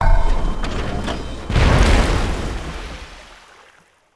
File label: Build Ironclad; Civ4 warlords